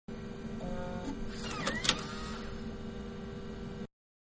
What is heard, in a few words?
Ejecting Floppy Disc, Computer; Electronics; Computer-Ejecting Floppy Disc; Computers